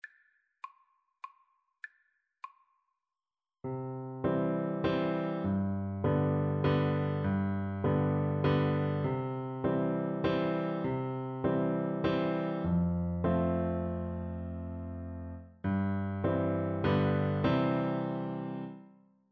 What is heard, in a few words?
happy birthday, Piano Duet